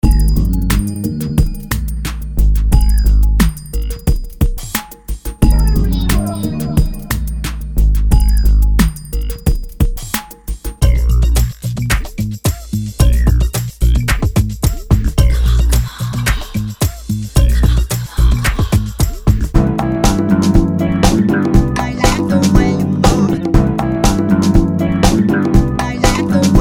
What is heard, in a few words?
Loops Instruments; PSP